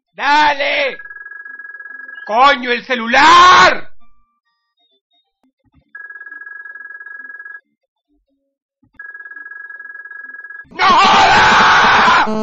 Ringtone; Dross

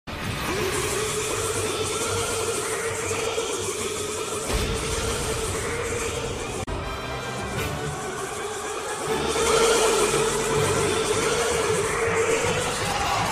Boost

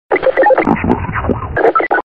Msg 4 U, radio; HL2

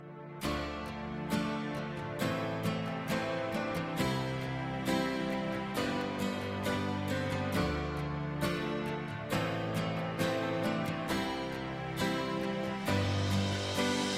Acoustic Guitar Sms

